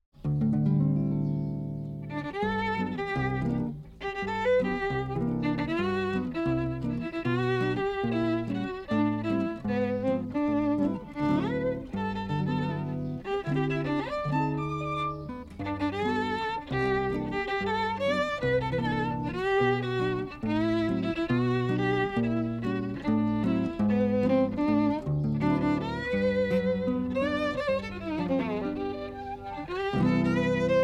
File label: duet